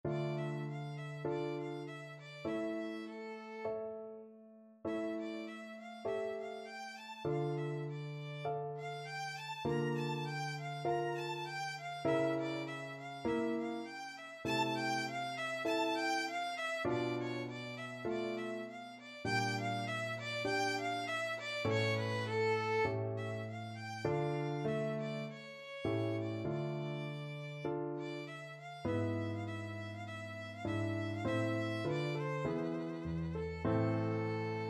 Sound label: concerto am 2nd rv356 violin